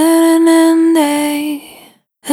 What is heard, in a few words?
Vapor Dry, Single Voice Melodic Loops; Pocket Diva World Class Vocal Samples